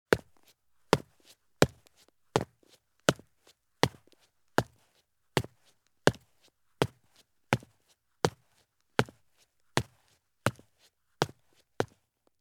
Soft Hiking Boots Take Slow Steps on Concrete Stairs; Sound Ideas; Footstep Sound Effects